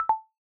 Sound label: Text Message Acknowledgement Thumbs Down; iOS Sounds